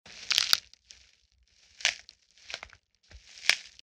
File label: stomp grass